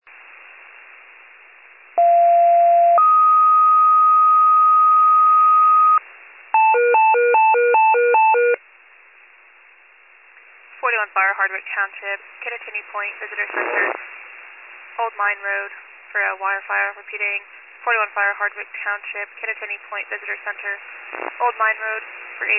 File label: FIRE pager alert